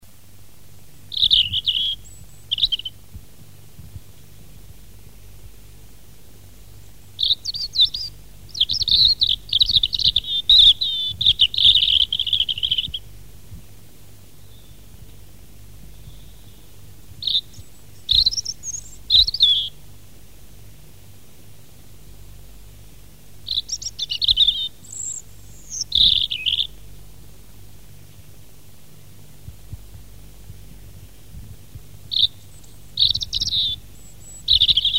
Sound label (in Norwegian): rodstrupe; fugler